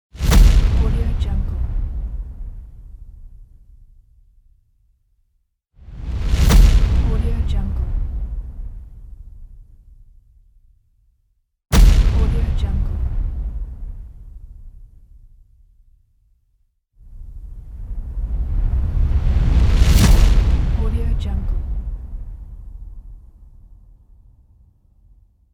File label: impact; wavbrulee